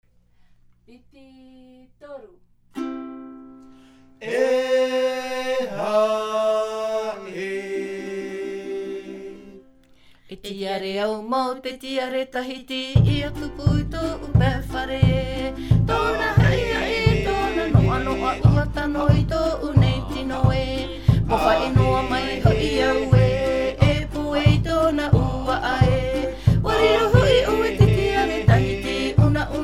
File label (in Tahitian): Himene ute, chants polyphoniques maohi; Te taatoaraa o te mau reo